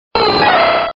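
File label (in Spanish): "Grito de Tyrogue", Pokémon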